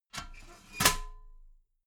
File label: metal ladder small handle fold regular